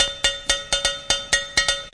124 bpm, latin singletone cow, drum loops and breaks